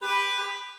car alarm

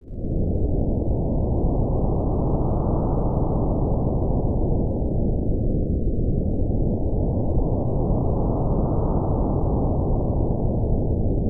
Wind; Silva Saga 2; snes

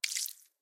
Blood Splut; Friday the 13th: Killer Puzzle

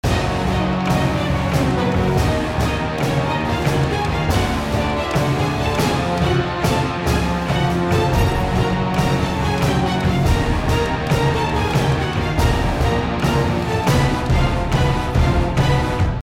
Battle Loop; Infinity Blade 2

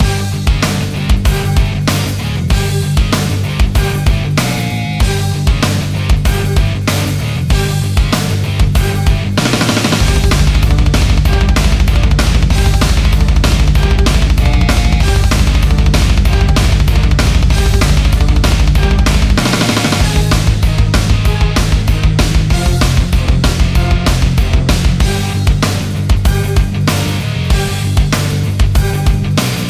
Battle Boss; android

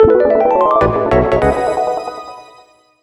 Puzzle Start; Picross S5

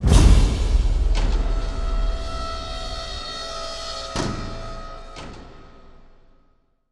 CYMBAL HIT BOW

